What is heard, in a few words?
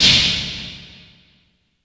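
EQ SNARE DRUM; DDD1; KPR77; Crypton - DrumBase5000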